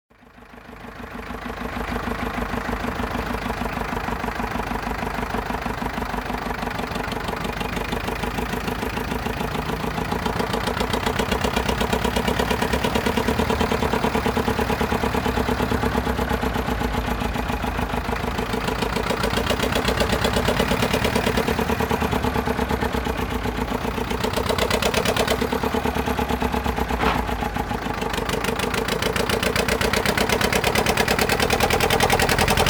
tractor engine driving off

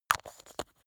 glass bottle close

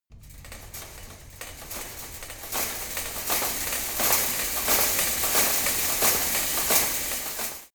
metal fence rattle hard

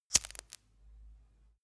lighter turn on

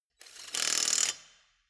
impact wrench screw fast single

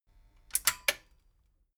metal button stereo click power off fast

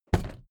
suitcase drop

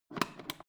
foot switch turn on off

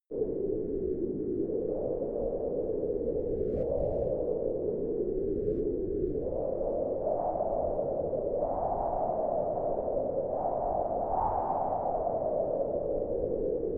wind howl interior

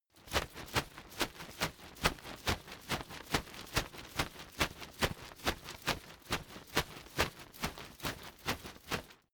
umbrella big shake

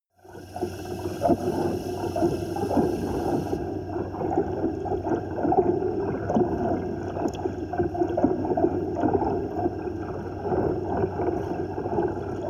water fx

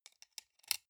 sunglasses plastic side piece fold rattle

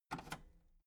metal lever button radio enable slow

